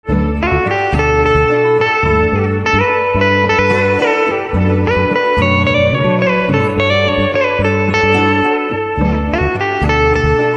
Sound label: Guitar